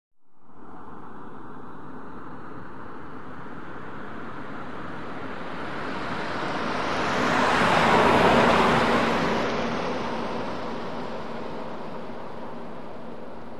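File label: Car Exterior Medium By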